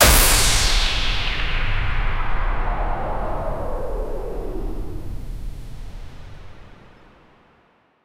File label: space bullet shot sound